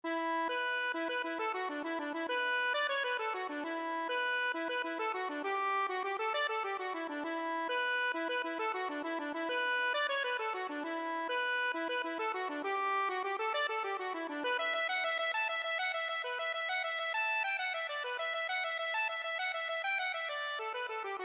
jig, accordion; morrisons

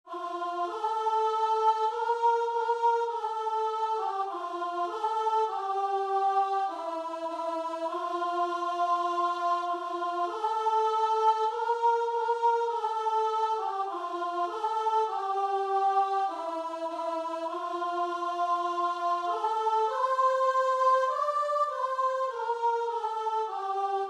my jesus, guitar and vocal

